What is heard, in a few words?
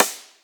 Snares, Rimshot